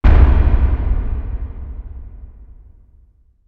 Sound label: Subwoof; chivalry medieval warfare 2012 pc